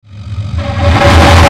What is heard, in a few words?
Distortion Dark Swell Burst; Blastwave